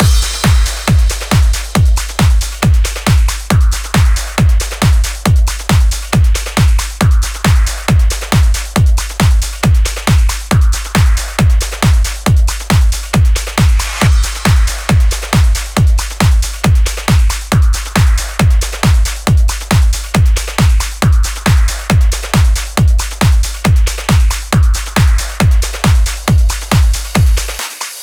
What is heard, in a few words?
137BPM Drum Mix 16bars; Drums & Perc; Vengeance Samples; Universe Kit; D minor